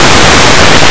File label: mail